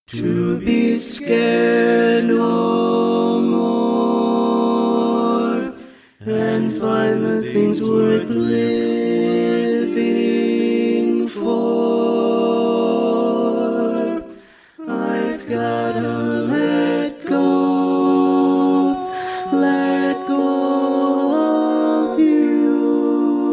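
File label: Baritone, Barbershop Tags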